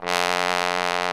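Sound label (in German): POSAUNE VIBRATO; Alpine Volksmusik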